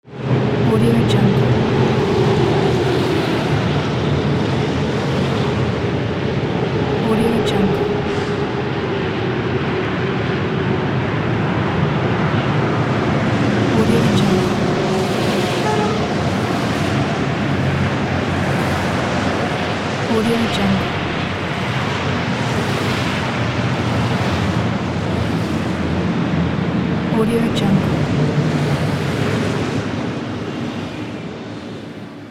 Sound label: city highway traffic